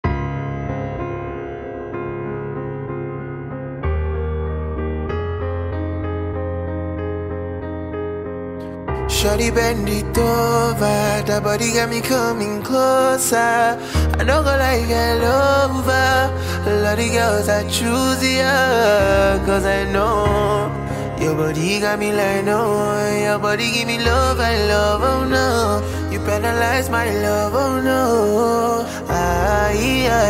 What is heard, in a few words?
Acoustic